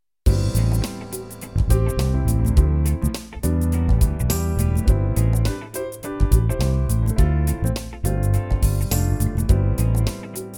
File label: Random Jazz